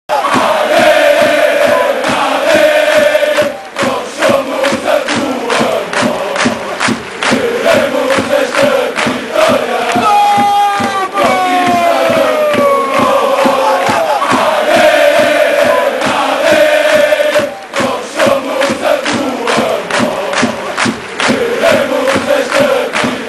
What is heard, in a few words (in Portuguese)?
Queremos Esta Vitoria, Chants